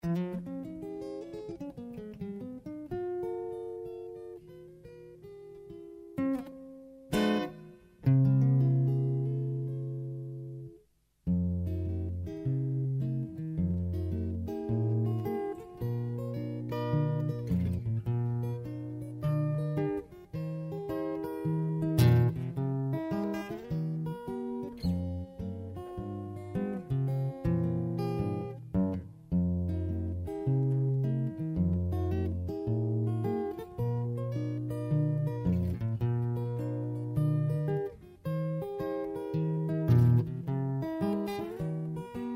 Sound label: six strings